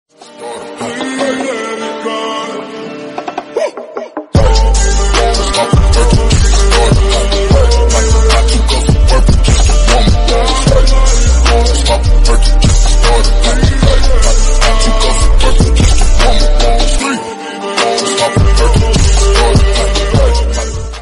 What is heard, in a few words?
mood off music